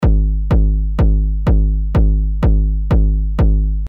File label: Long Decay